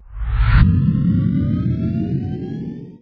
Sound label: Takeoff, Trader Ships; RimWorld Biotech